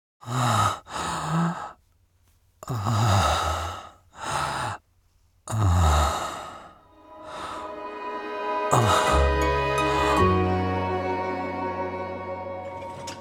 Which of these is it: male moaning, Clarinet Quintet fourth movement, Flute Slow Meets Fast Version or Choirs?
male moaning